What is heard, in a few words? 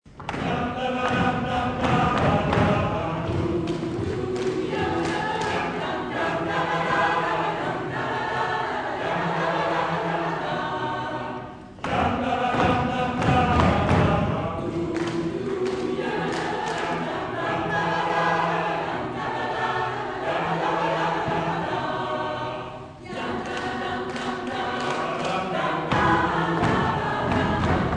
Intrada a cappela